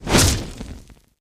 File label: fs Hit Heavy Volcano Add; wild worlds